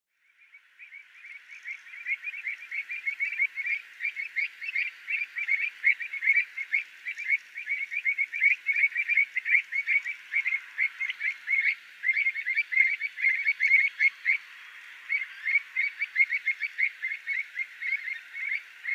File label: flock; rodbyhavn dk; a.celmins